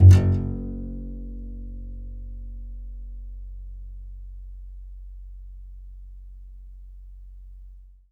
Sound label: C. Bass Balalaika, Plucked Strings